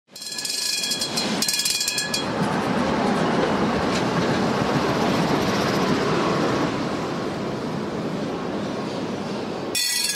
lvivskyj tramvaj

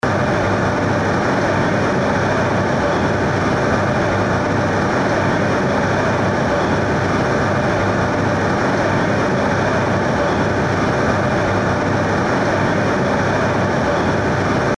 turbina kahovskoyi ges

